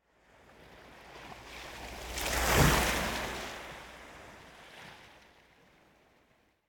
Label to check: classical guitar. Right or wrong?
Wrong